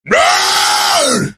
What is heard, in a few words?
rauuuughh